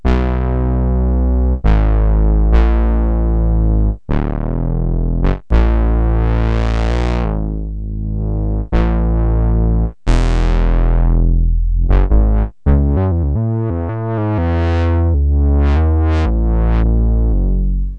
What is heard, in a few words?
bass; nebulus